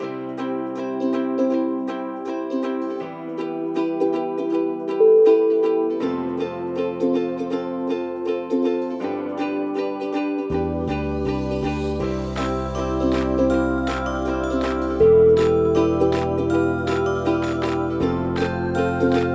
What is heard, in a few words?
new year ukulele